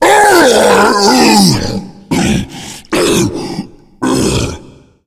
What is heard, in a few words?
tank death; voice; die; Left 4 Dead 2